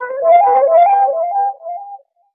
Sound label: flute stabby, Loops, Sad